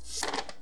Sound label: open map; cartographer